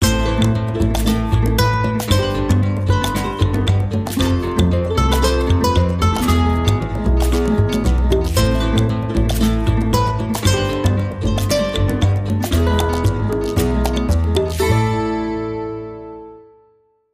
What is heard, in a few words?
Acoustic Rhumba; Sting